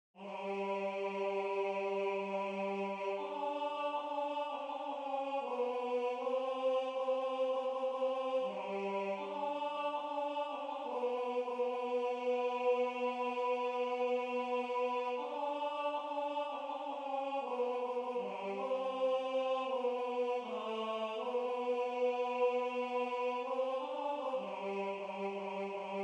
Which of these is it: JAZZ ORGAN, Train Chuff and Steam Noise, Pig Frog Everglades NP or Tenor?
Tenor